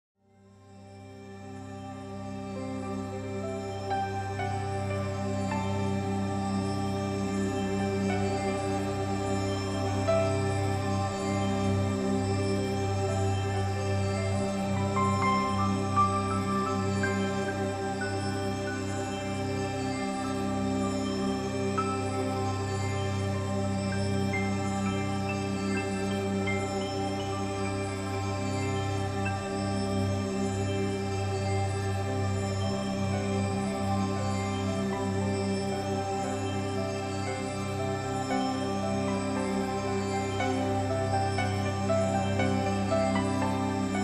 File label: Texture Blooming Angelic Piano Shimmer; Trailer; LYN115 Trailer Production Elements - Texture 12